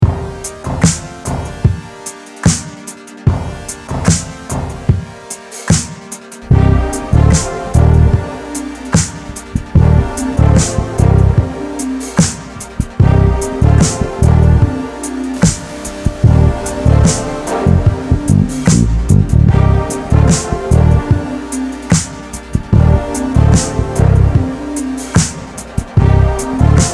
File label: Third Take bass up; three ways to improve any groove